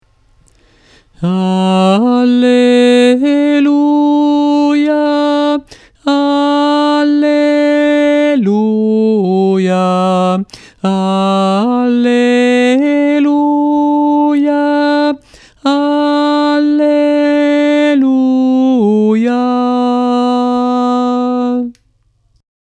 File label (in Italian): alleluia irlandese tenori